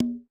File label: Percussion, Korg 01W; 300 Drum Machines